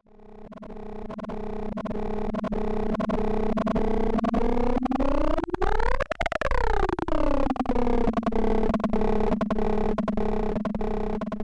Incomplete Thunderstorm Stems; Coruscate

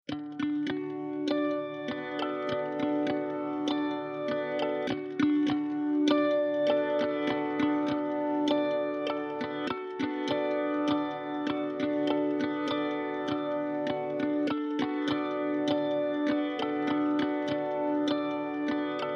D 100 Harmonics Groove

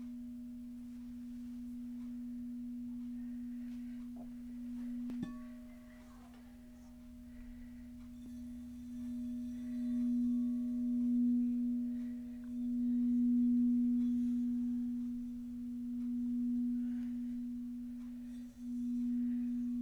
Singing Bowls

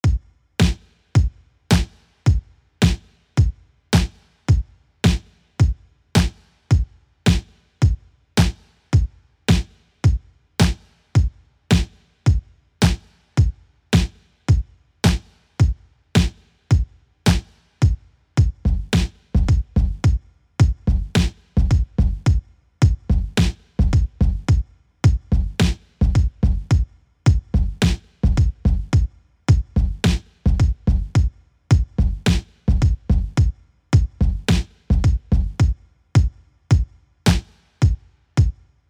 palm trees drums

